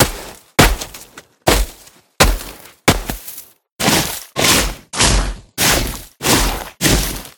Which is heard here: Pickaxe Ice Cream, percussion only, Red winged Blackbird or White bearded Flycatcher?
Pickaxe Ice Cream